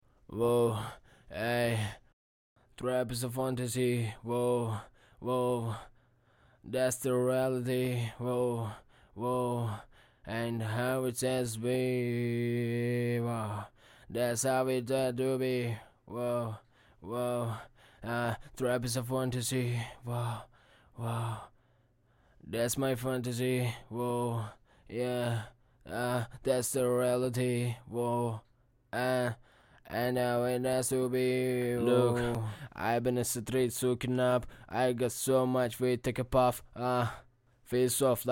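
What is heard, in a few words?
hip hop, 62, B major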